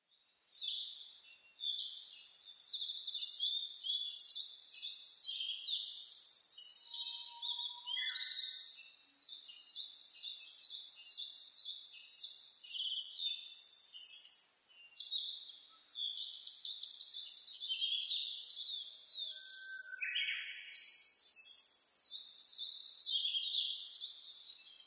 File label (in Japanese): yabusame